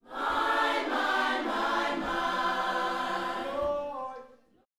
Vocal, Gospel, PHRASE; Spectrasonics

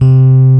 RHODES C4; E-MU Formula 4000 Series Vol. 3; Analog Odyssey; VK Instruments

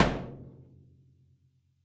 DAV ZWEIG F, sticks; SoundBytes - Early Patches

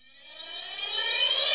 Reverse Cym, Cymbals

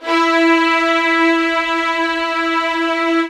LDVLN1 E4, LOUD VIOLINS; Q Up Arts; Denny Jaeger Master Violin Library

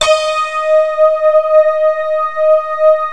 NEON D#5; M1+D50

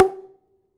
Prepared Drums

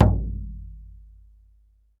BLACKFOOT, American Natives; E-MU Formula 4000 Series Vol. 4; Earth Tones

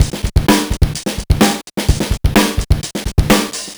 Gated 127, Beat Loops; Zero-G; Monster Beats

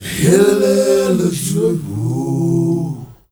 MALE GRP, CHOIRS; Spectrasonics; Heart of Africa

